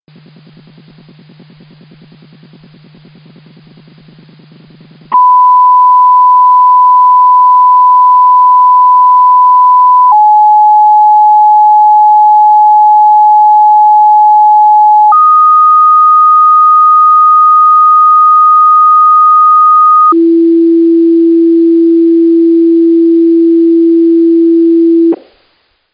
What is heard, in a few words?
fixed tones dstar